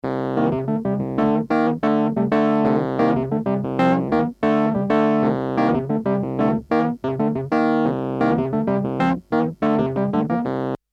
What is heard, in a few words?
5ths Keys Dry